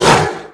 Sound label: gloves attack; weapons; breadmonster